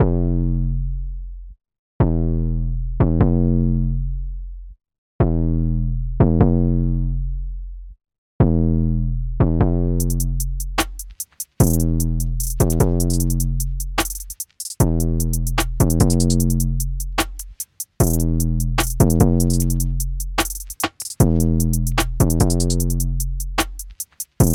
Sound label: beat